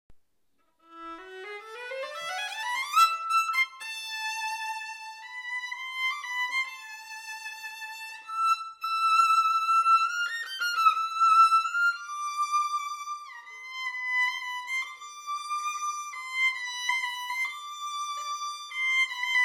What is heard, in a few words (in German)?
Charaktervoll warm abgerundet